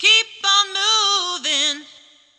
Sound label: Sing Voice Moving; Choir